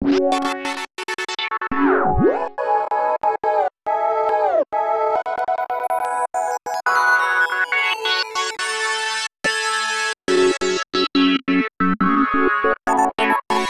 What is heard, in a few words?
Transition Space Choppy F#; Bust n Rush